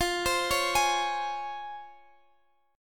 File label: C sharp Major 7th